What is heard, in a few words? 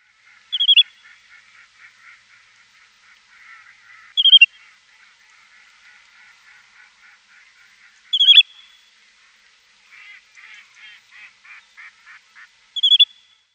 Little Grebe winter calls